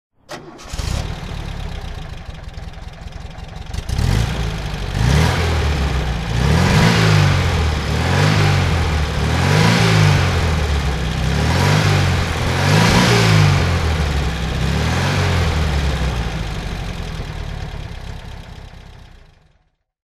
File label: porsche 356c cabrio throttle